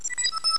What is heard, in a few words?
doorchim; Door Chime